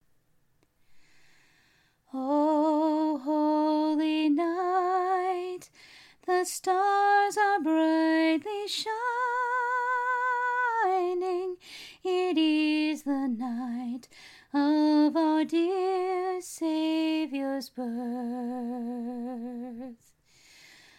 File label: O Holy Night, Acapella, Vocal, Song, Singing; [Christmas]